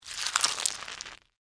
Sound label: Crunch Egg Shell; Texture; Vegetable; Crunches; Objects; BlastWave